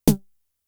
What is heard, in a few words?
Tom, Toms; Fricke Schlagzwerg; drum machines